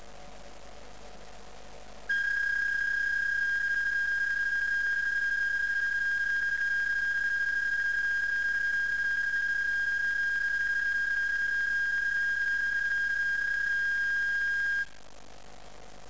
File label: psk31